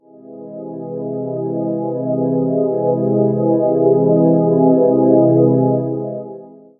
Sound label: pad; compressors